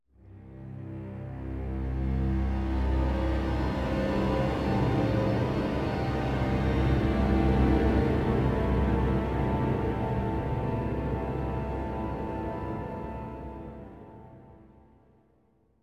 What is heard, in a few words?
Riser; N.O.V.A. 3 Near Orbit Vanguard Alliance